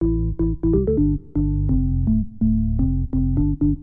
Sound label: ORGAN HOUSE 125 A; eJay; Keys